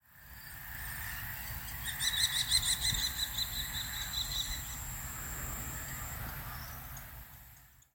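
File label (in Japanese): チョウゲンボウ, カラスに威嚇; 新潟県新潟市秋葉区; bird song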